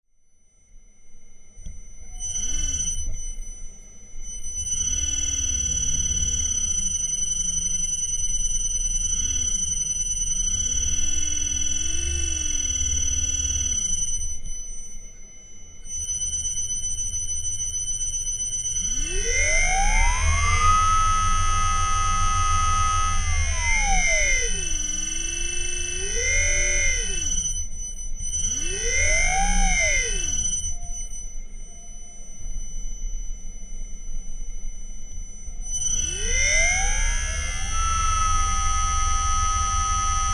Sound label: From zero to 2600 rpm with field weakening; Liebherr; Eimskip